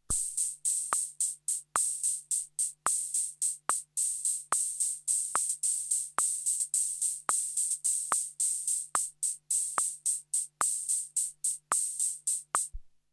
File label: TR 77 dry